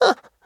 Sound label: thinking; Scrat